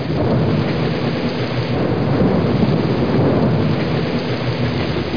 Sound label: storm; The Amazing Spectacular Ordinary Bottle